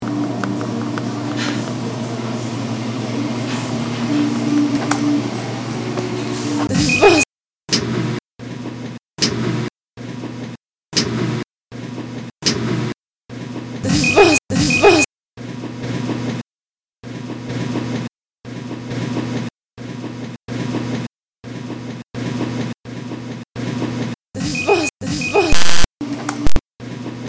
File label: dryer